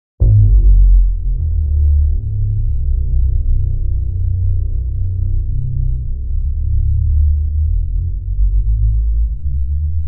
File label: LFE Electronic Pulse with Heavy LFE, Phase